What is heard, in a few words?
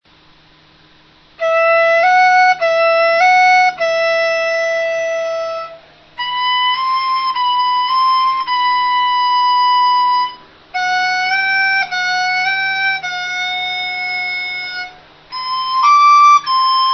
dizi